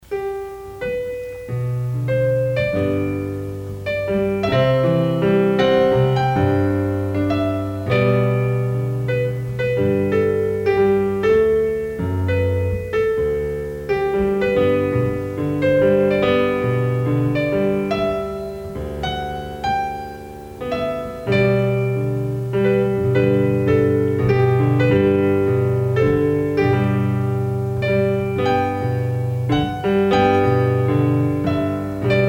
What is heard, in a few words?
Sweet Hour Of Prayer (Organ)